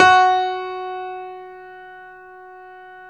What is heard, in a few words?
Stereo Grand, Keyboards